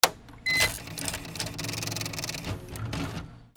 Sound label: OFFICE